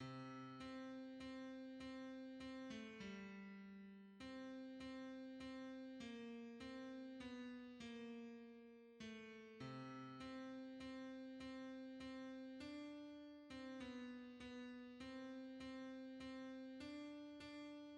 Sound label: tenor; hymns; Thou God of grace our Father